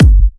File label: Kicks; Vengeance Samples